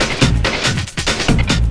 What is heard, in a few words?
LOOP SD, Drum & Bass, 140 BPM; Ueberschall; Drum & Bass Flash Trackz